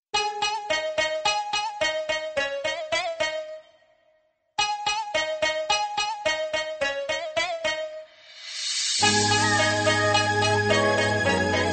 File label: chinese sms ringtone